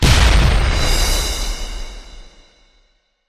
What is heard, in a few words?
big win text change; elements